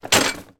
industrial hand cart bounce down single concrete step with boxes on; sound effects one